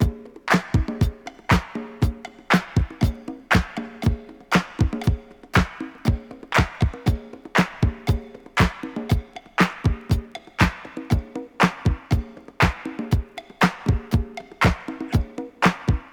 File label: 119 bpm modern drum loop B key; drum loops